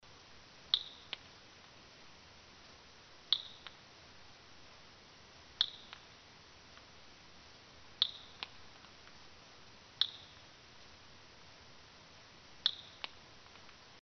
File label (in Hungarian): magyar szocske; uj szocskefajt magyar kutatok